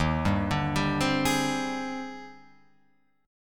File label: Eb Major 13th Eb Guitar Open F; EbM13; arpeggiated; JGuitar